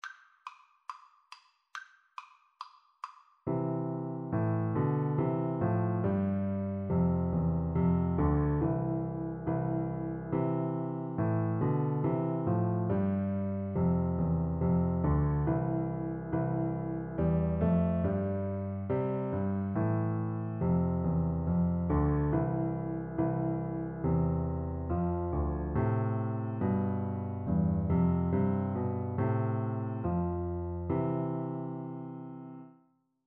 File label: piano duet